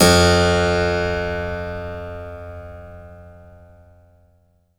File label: HARPSI F2; HPSCHD 4